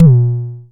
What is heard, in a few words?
analog pitched, Kickbank; mpk mini presets; Ableton Foundation FreePacks